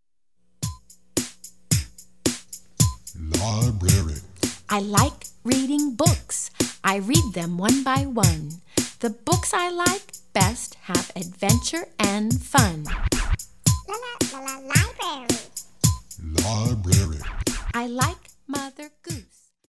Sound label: Book Shelf Rap